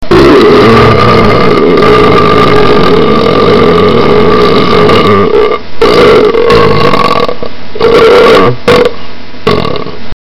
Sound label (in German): ruelpsen; Rülpsen 13